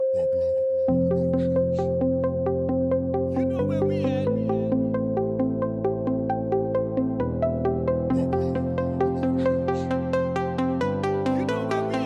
POP EDM

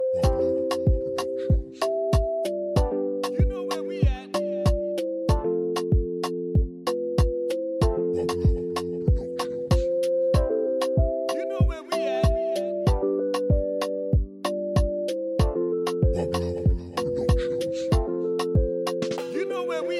Latin Reggaeton

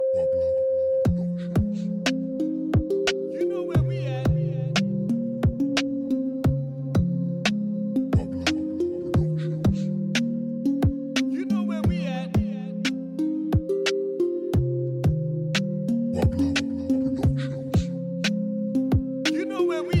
Reggae Dancehall

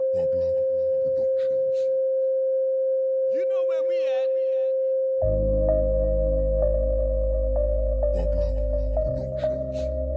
Reggae Dancehall

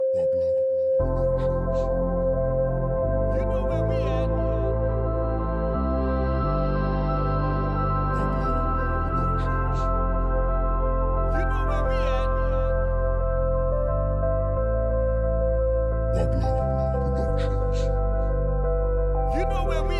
POP EDM

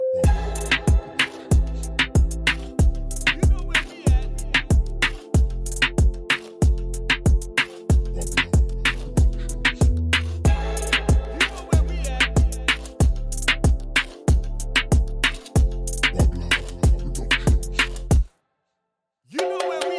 Latin Reggaeton

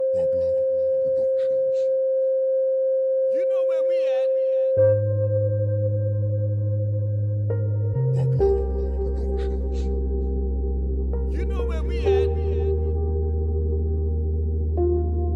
Reggae Dancehall

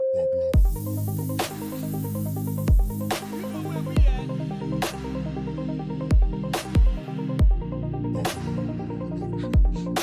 POP EDM

